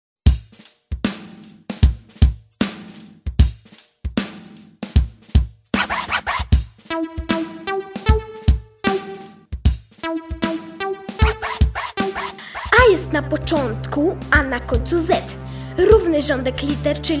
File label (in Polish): rap o alfabecie - śpiewanka